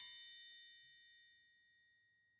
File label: Triangle B Open; Acoustic Ringtones